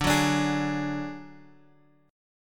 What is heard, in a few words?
D Major Flat 5th D Guitar Open G, chord